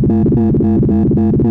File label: Moog C1, Synthesizer; Hollywood Edge; Giorgio Moroder Rare Synthesizer Collection; MLTI.MOOG